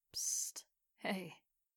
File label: Psst Noise Boy Girl